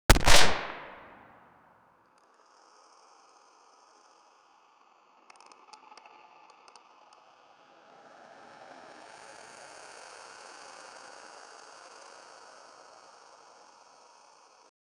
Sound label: realistic gun shot at 0